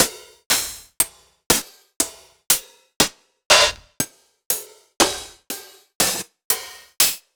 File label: Hats Open; monosounds